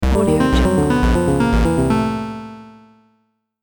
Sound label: chiptune fail game